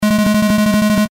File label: beep; potry v2; superhot